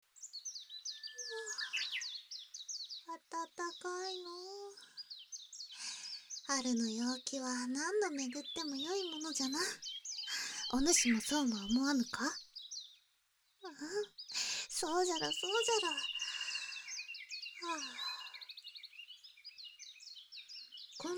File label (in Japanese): 耳かき, ASMR; お狐様とまったりお花見しながら